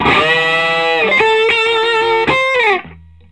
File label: Vintage Blues Guitar, SOLO; USB Soundscan vol.22; AKAI